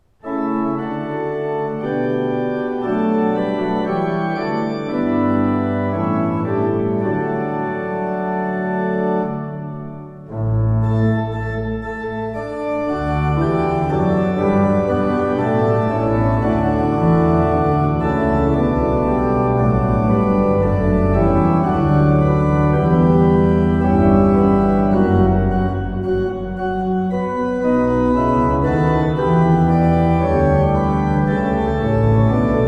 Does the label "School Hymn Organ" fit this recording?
Yes